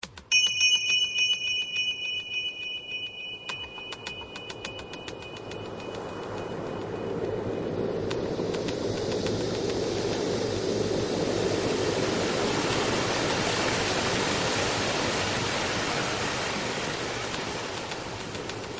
ocean monks; mysterious monks

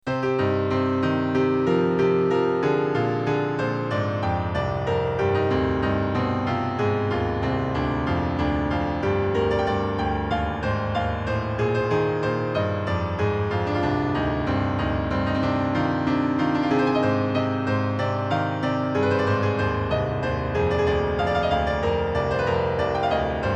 looking window piano

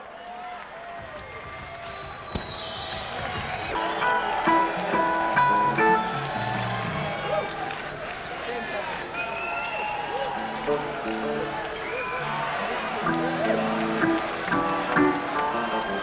Crowd; schoeps

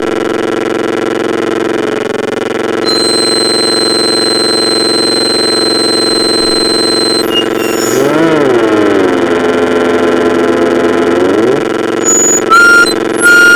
No Input Feedback Sine Waves

